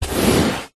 foley wood pole end short scrape drag on carpet; zapsplat

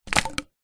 handfull of sticks drop hard ground; foley

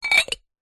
household ceramic bowls square x2 stack

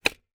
toy plastic jack in the box push down

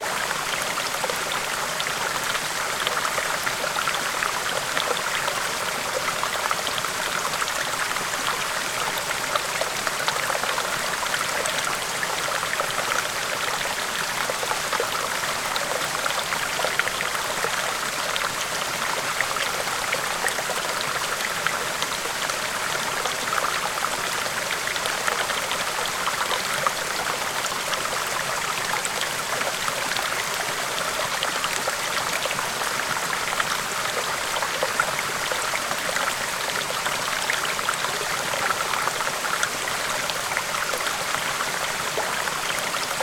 water stream waterfall small mountain; sound effects one

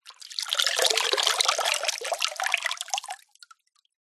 nature water sudden fast drips into shallow water

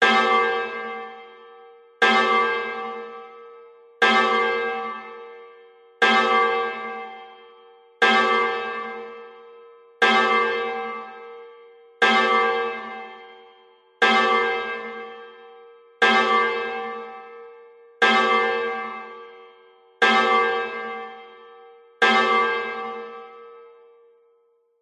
bells church bell ring 12 ext med close; zapsplat

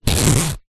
wetsuit zipper; foley

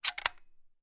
gun arisaka; still north media

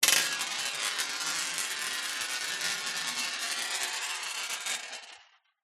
industrial ball bearing metal roll glass surface

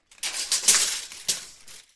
industrial tool tape measure tape metal bend move

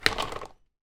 vehicle bicycle drop down land with baby seat

